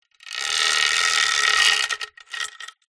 industrial chain metal small thin move over wood rattle